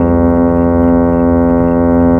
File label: Harmonium Pedals; Sonic Foundry (Sony Creative Software); Loop Noir; Session 12 - Otherworld Journey